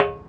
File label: Bust, percussion; Hermann Park; Bust of Benito Juarez; Houston